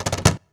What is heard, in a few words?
CAJ FILL, cajon fill; Univers Sons - Raricussions